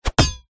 warfare weapon knife throw hit body against wood pin stab hit; zapsplat